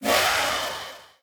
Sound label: shield break woof; Apotheorasis